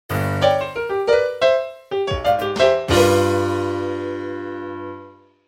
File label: Blues